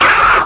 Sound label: attack growl, bullsquid